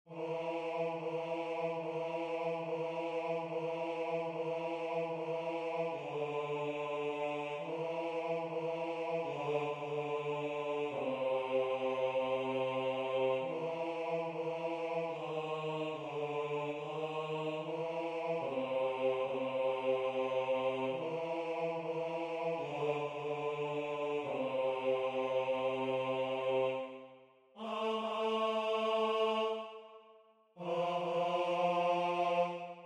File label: Baritono; Atzokoak